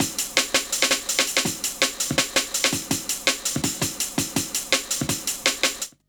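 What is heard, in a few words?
jungle loop, 165 bpm; Explosive Jungle; USB Soundscan vol.05; AKAI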